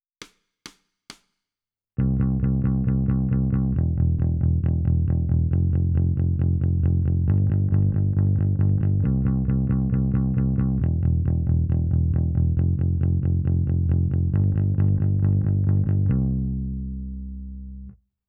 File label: Bass